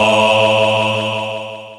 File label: voi voice syn; Ueberschall; Techno Trance Essentials